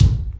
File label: bathtub step; Golden Crayon Sounds